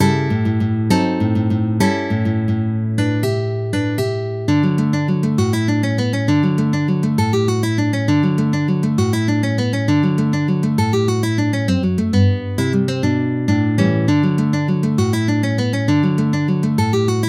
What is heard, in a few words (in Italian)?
serenata spagnola